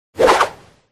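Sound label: centipede; skolopendra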